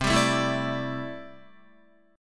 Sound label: C 6th Add 9th C down Guitar; C6add9 strummed